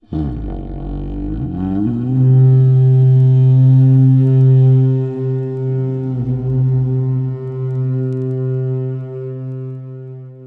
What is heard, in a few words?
book dead horn; Warcraft 3